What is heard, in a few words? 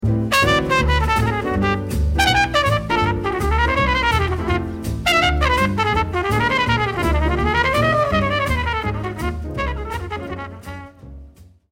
solo